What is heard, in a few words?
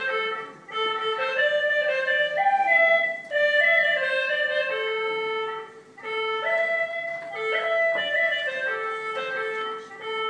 traditional Chinese flutes